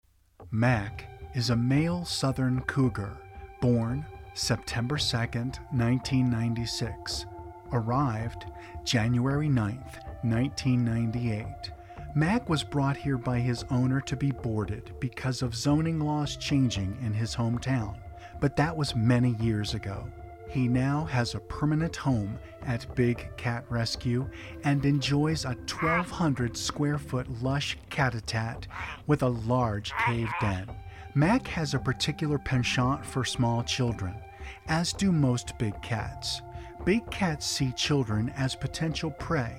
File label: Cougar